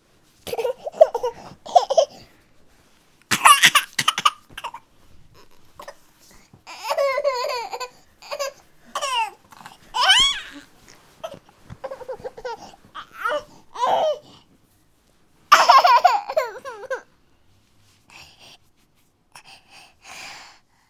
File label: Newborn Baby Crying Sound Effect; Baby Whine – Baby Crying